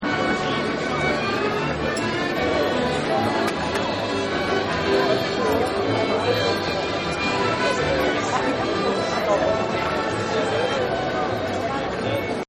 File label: Crowd Music; Crowds; Sound Effects:Backgrounds